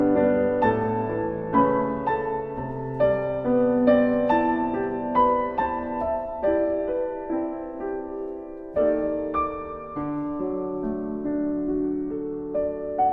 piano

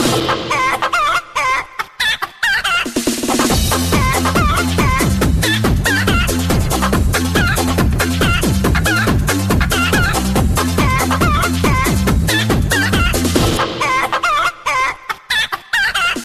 Chicken Songs

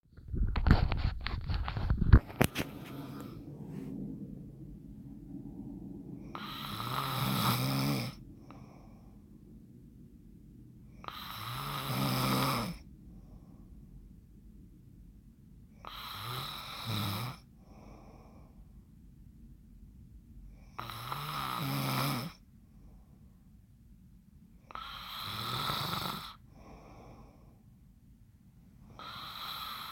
Snore

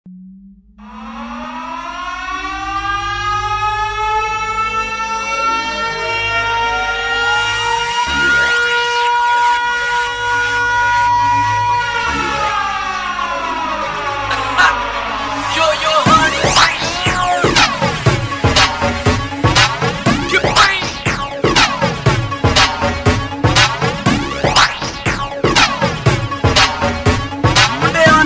Mix

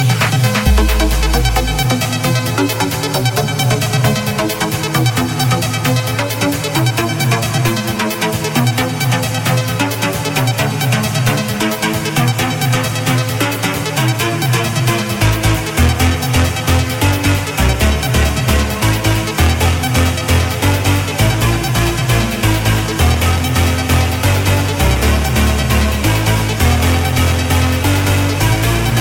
Trance

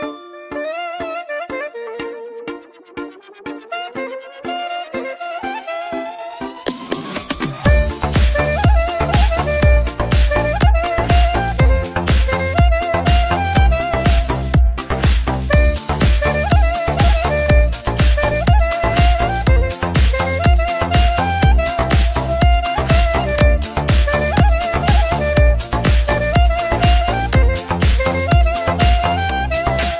Arm Clarinet

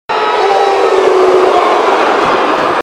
yell; Roman Reigns

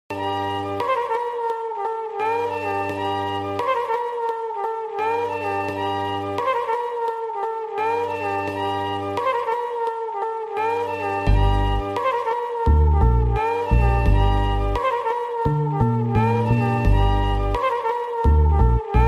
trumpet simple

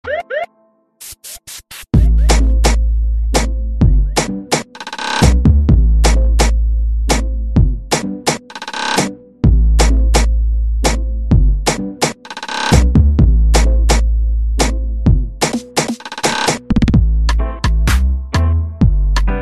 Instrument

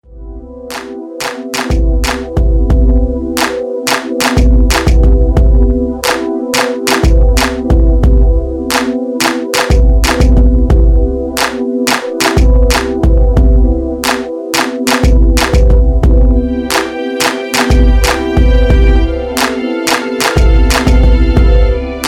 Hip Hop Beats

